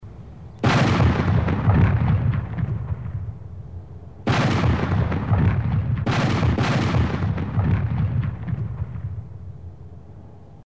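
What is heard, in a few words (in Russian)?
звуки пушки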